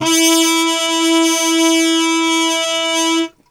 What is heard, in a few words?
E 2 THM, Brass Super Section, TRP HAR MUTE; Best Service; 90 s Sample CDs